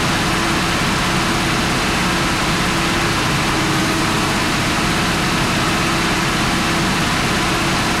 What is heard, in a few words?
panzer6 engine rpm, engines tanks; War Thunder